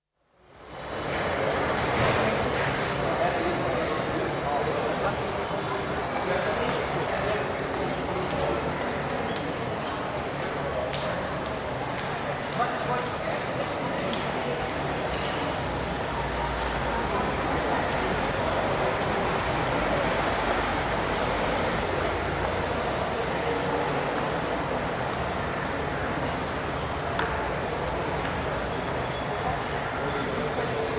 Shops Hungary, Shopping arcade (covered), Budapest, echoey, with footsteps and some indistinct speech (including noisy child near end); Stores And Mall; BBC